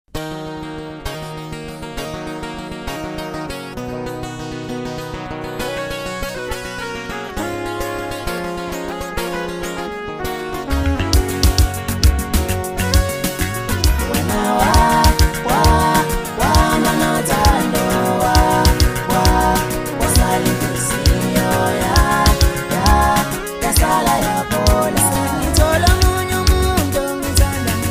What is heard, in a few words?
Maskandi Music